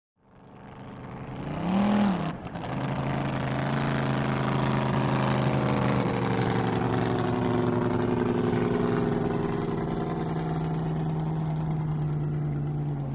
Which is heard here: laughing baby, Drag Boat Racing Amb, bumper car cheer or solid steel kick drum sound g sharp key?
Drag Boat Racing Amb